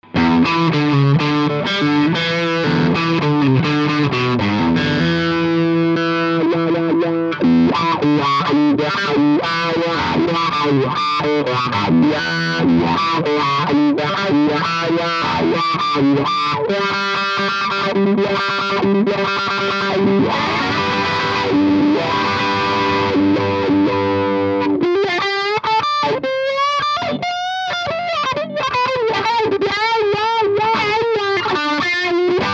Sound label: jh1 crunch